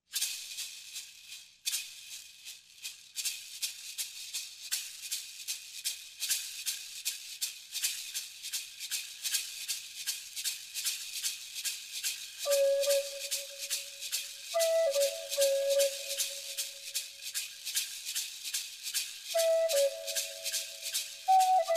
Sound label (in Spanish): Flauta Zen